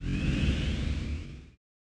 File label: friend join; Steam; friends